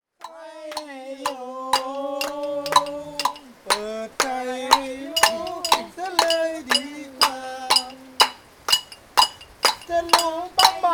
Banana plantation celebration, Thailand; Les Cartes Postales Sonores; NOISE ON EARTH Vol3

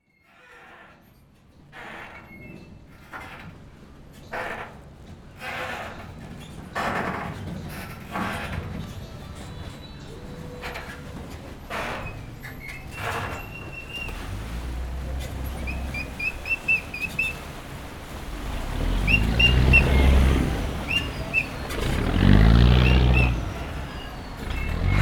Waiting for the boat, THAILAND; Quay Kiak Kai

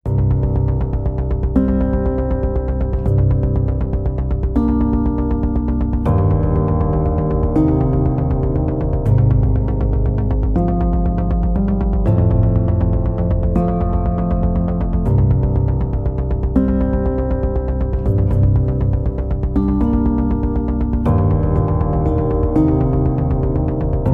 Slow gentle piano with electro beat; CUES for Film TV Games etc SOLO PIANO - or mostly piano

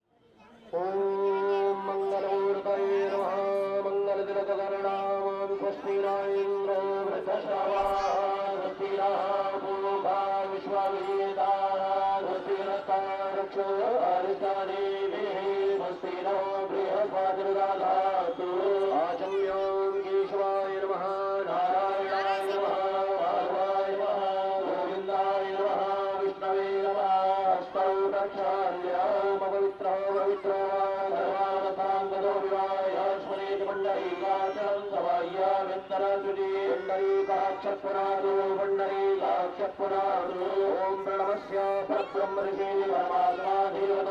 Ganga Aarti Ceremony Haridwar, Religious Music; Samuel Corwin; Selected Field Recordings from India and Nepal Volume II Religious Music

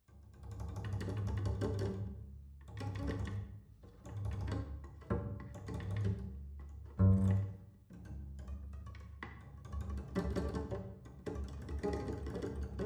Prepared Double Bass

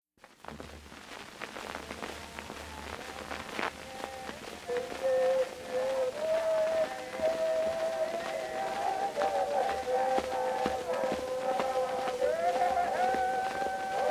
Tahitian field recordings, Chorus; Edison Blue Amberol; Himene Chorus; UCSB Cylinder Audio Archive

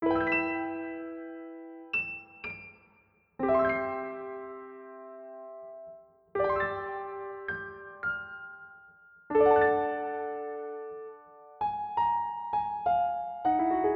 Piano Music

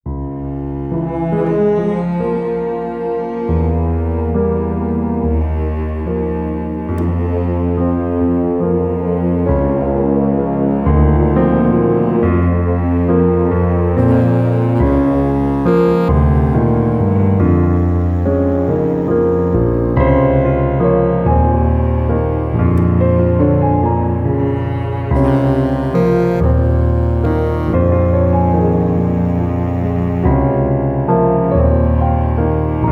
Slow Unease Confusion Piano Brass Clarinet Cello

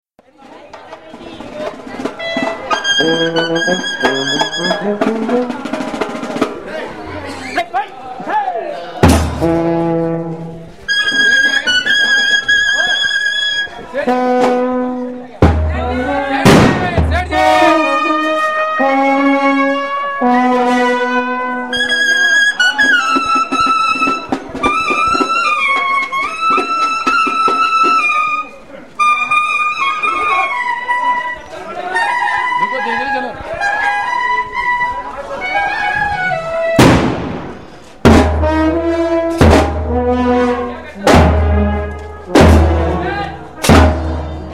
exploding parade, Field Recordings from India; WFMU

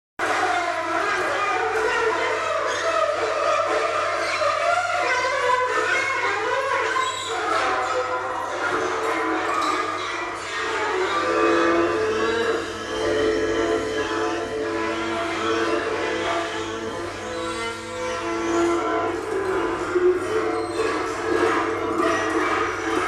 6-String Down Pipe Mobile And Whirled Speaker

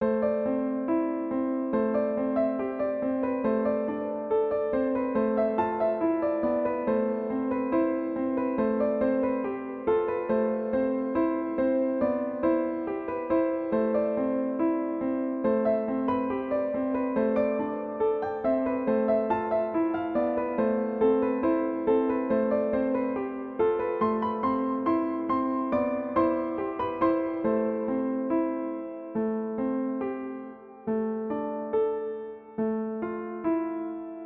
Piano

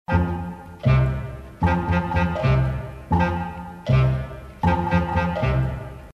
clarinet clock loop; ShortDocs 2010 sonic doodads; Third Coast International Audio Festival

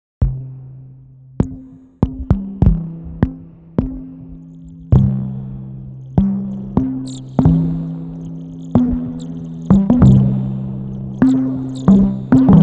improvisation turned out as a lullaby

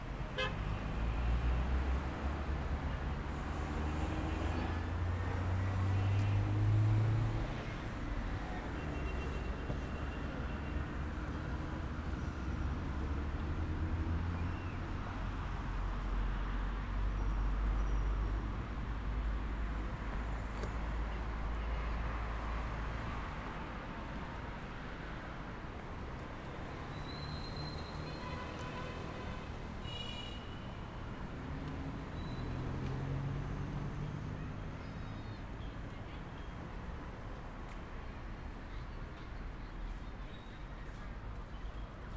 Parc Leshan, CHINA; Les Cartes Postales Sonores; NOISE ON EARTH Vol6

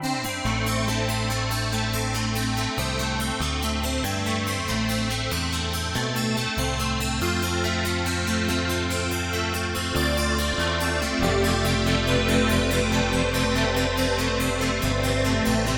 Emotive Jangly guitars bass; CUES for film TV games etc; POSITIVE

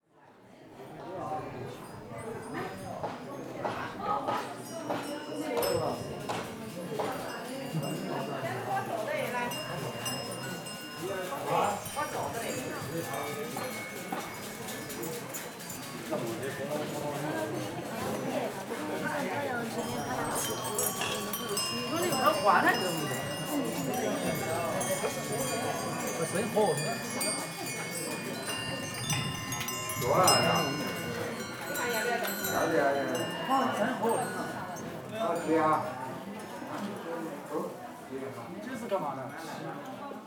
Bells of the pagoda, Thailand; Les Cartes Postales Sonores; NOISE ON EARTH Vol3

